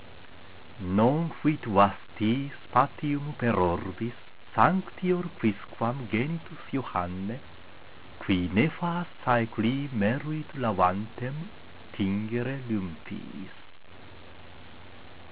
Utqueant, latin

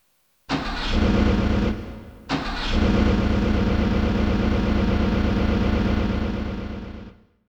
car engine start

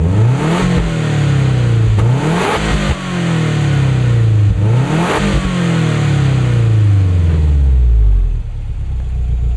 racing, impreza, ex mani; my impreza

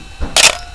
efx, manual camera; CANON EF; シャッター音聞き比べ

